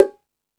Latin Drums, E-MU Pro-Cussion; drum machine

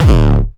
909 Grime C#1, Kicks; Mbase 11 Kicks

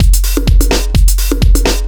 Beat, Drums, Eclectic Electronica

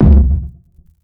KICK UNDERWATER

